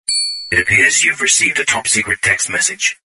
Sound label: text message tone ringtone, sms ringtones; top secret; galaxy sms ringtones